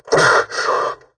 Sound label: redout short; War Thunder